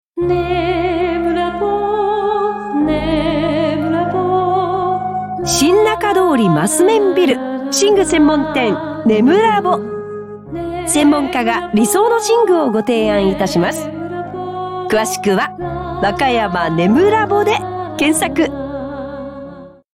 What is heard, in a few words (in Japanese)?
komoriuta